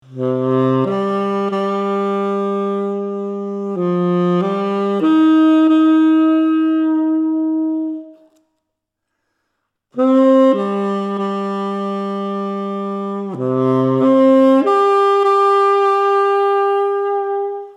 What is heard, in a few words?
Fanfare; Nils Thoma Eigenaufnahmen (Reha-Klinik, Bad Schandau)